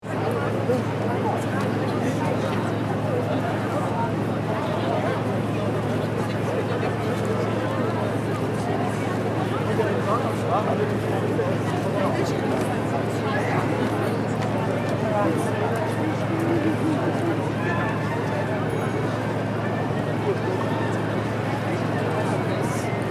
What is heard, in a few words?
Museum ambience crowd interior